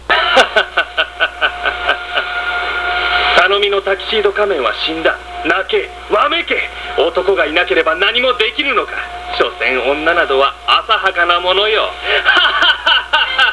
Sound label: hahaha